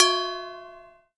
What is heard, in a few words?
Bells